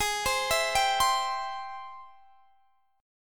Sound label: Ab Augmented Major 7th Ab, guitar; x,23,22,21,20,20; Ab+M7 arpeggiated